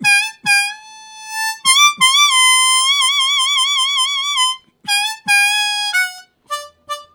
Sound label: Vibrato, Harmonica; Zero G